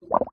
nature water bubbles bubbling in foamy soapy water short burst